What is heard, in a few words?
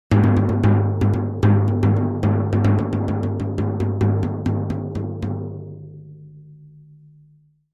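Drums Toms B Lng Battle, drums tom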